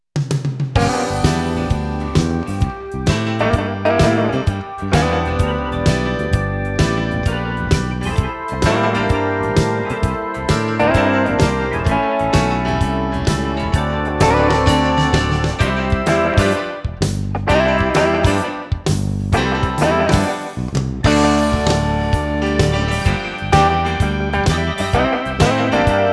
BLUES; Cascom

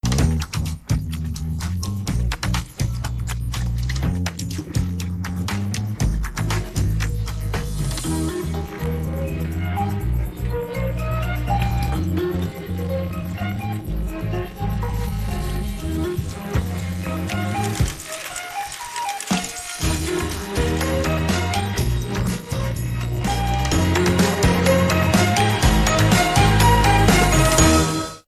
bright pop

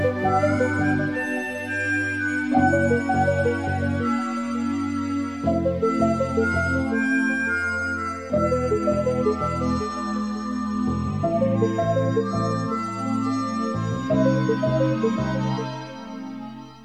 romantic

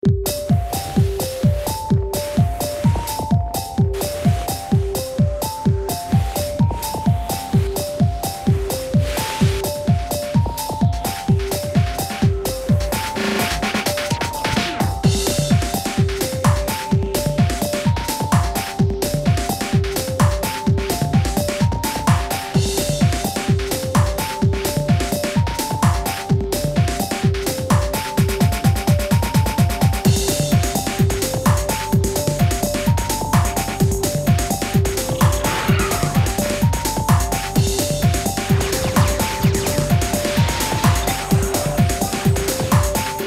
future tech 128bpm

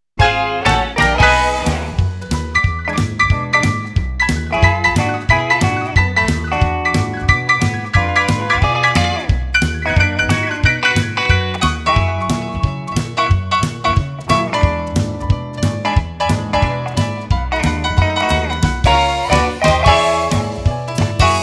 BLUES; Cascom

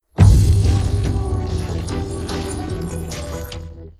retro synth

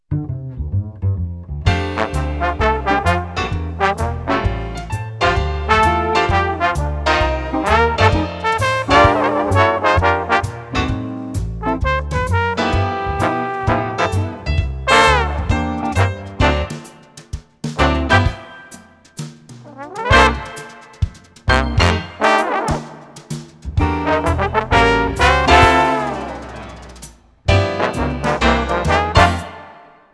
BLUES; Cascom

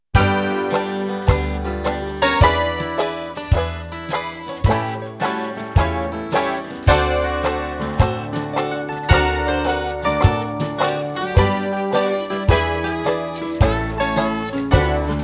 BLUES; Cascom

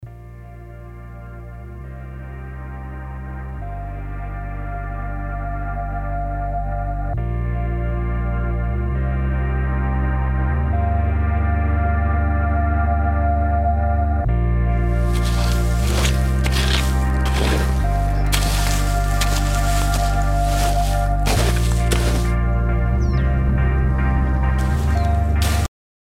cinematic pop

